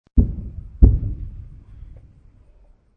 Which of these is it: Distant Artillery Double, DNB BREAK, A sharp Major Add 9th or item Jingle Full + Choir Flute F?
Distant Artillery Double